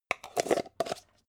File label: jar plastic midsize close